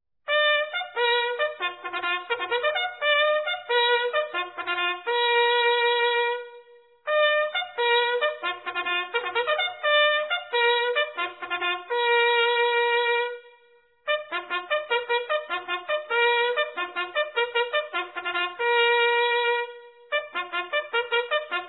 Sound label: Reveille, bugle calls